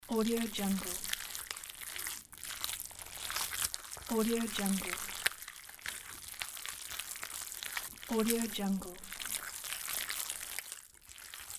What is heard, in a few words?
sloshing in dirt